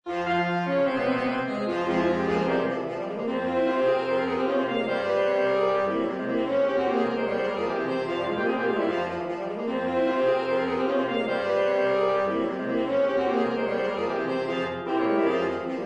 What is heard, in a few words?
Saxophone; Fillmore; Rolling Thunder